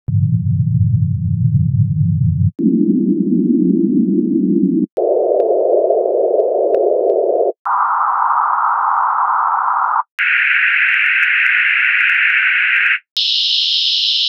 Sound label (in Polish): szumy waskopasmowe